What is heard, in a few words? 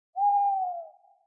owl